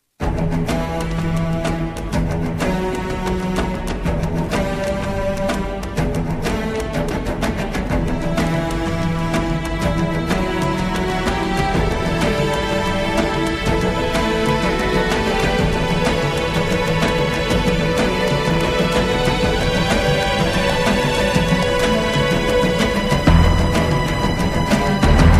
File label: Action Film